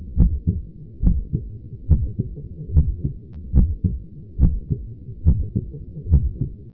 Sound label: heart